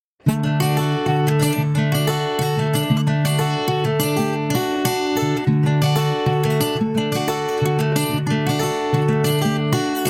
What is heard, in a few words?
guitar